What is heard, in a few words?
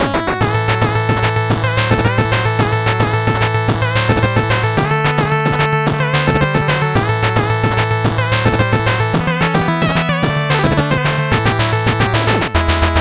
8bit bgm fast